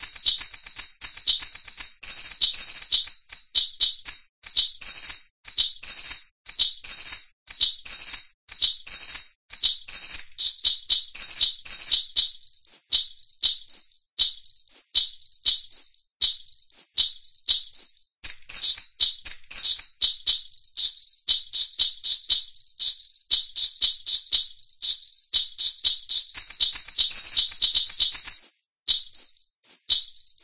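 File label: ghungroo, groove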